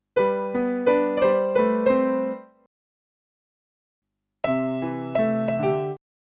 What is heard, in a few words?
grace note 1 (short) versus grace note 2 (long)